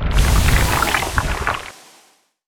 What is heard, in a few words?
magma; weapons; dota; snapfire